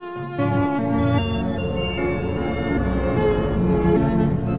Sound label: STR, STRINGS; USB Soundscan vol.03; AKAI